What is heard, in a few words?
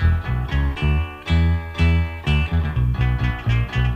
guitar, Phonky Loops